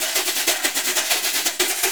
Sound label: Percussion Loops, Shaker & Tamb; Houseworx